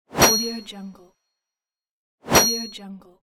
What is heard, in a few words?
whoosh ding; transitions and movement